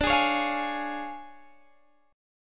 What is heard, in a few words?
D Minor Major 7th Flat 5th D Guitar Open D